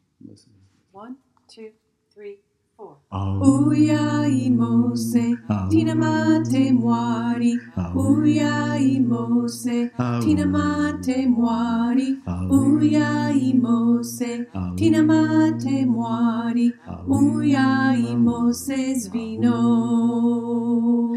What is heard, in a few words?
All parts, choir